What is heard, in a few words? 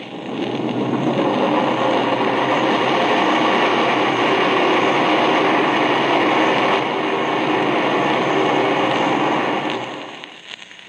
Ballast Tank Bleed